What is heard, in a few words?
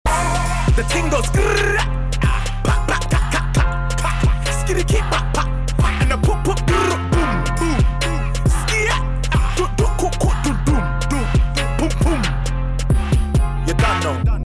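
skrrrrraaaa funny track that became a meme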